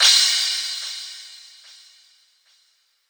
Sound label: Crashes & Cymbals